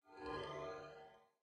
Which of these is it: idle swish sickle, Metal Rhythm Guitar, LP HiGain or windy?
idle swish sickle